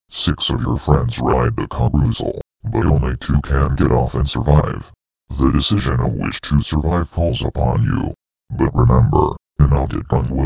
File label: Carousel Trap